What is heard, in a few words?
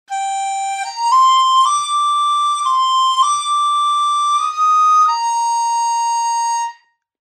Bangdi Sustain Legato; Phoenix Orchestra